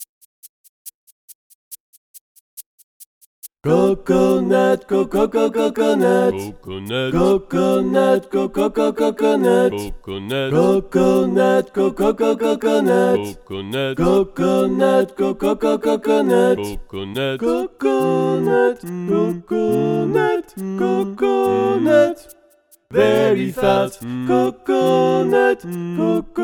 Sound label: coconut song sans lead soprano